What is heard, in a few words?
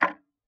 Tick, Clocks Kitchen Clock